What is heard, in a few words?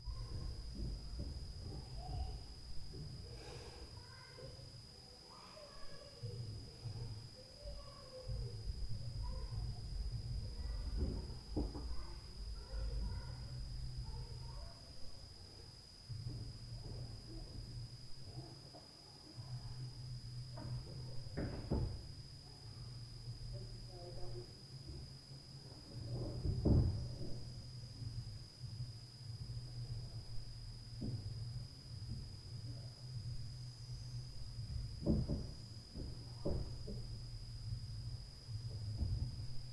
UPSTAIR NOISE, Night Noise; + TAC